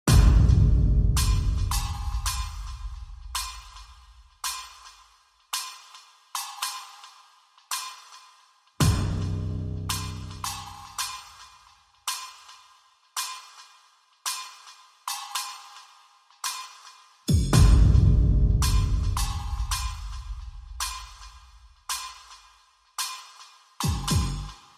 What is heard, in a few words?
Drums Only